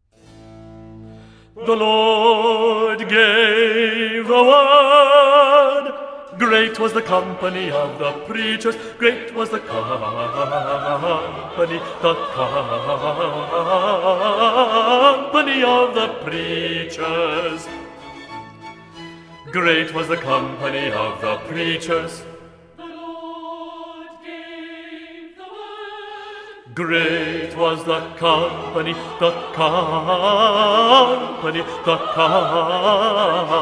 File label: The Lord gave the Word bassi; Messiah; handel messiah